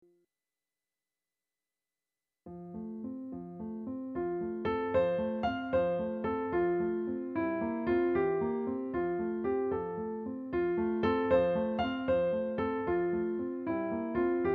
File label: French Folk Song